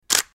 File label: camera shutter